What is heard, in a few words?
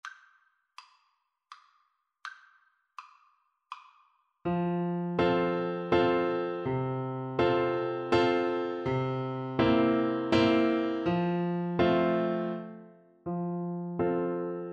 French Horn